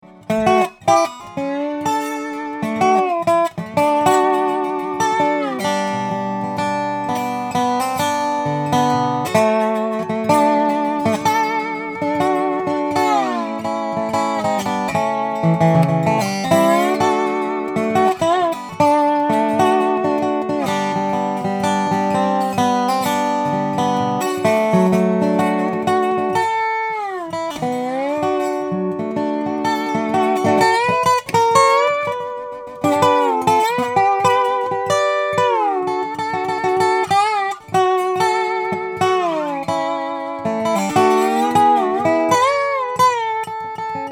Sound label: resonator; Scheerhorn; Wechter